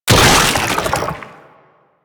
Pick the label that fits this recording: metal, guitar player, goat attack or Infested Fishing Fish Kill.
Infested Fishing Fish Kill